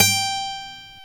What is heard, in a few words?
GTR 6STR E, Steel String; Roland LCDP02 Guitar and Bass